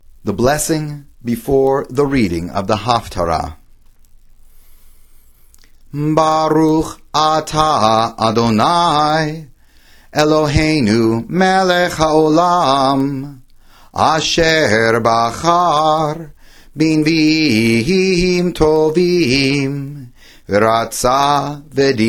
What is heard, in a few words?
blessing before haftarah chanted